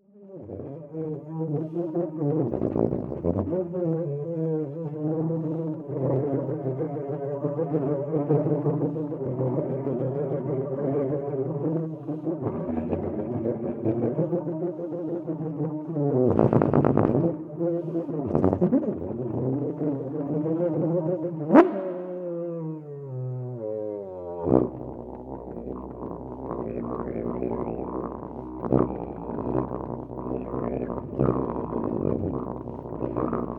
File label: bees